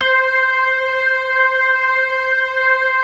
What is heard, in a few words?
DRAWBAR VIB, Synth and Organ; InVision Interactive; Keith Emerson; 4DRAWBAR+VIB